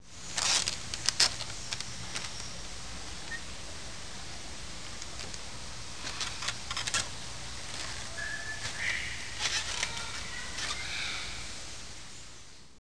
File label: bridge, Soundwalk